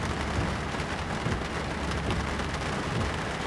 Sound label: car interior rain idle; prague; Soldier Of Fortune II Double Helix; XBOX; ambience